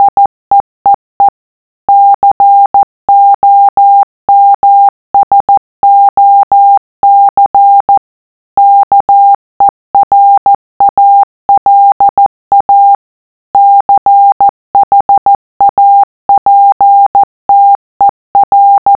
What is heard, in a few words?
morse